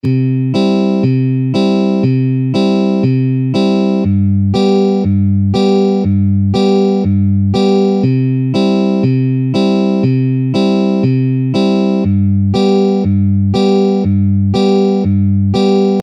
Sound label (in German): Zupfmuster; pfadfinden